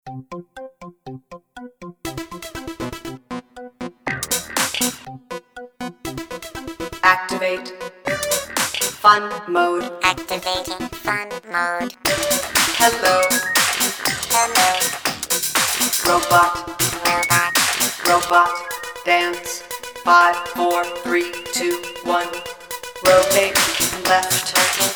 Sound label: Robot Dance